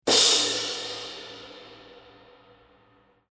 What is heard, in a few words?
crash